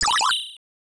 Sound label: Coin; Heavy Metal System 2